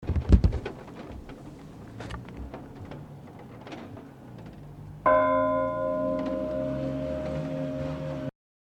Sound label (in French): cloche; Guingamp